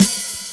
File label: Open Hihats, Cymbals; Vengeance Samples